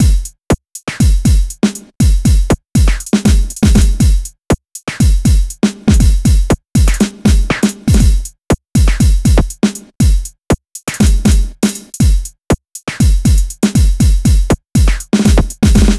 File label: Beat, Drums, Eclectic Electronica